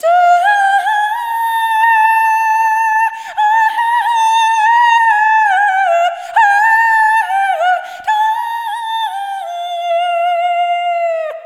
Arrhythmic F

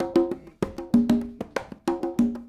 Congas, 70s Analog Funk

